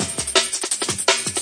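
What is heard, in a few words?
raw clean, drum loops and breaks, 168 bpm; 161 to 180 bpm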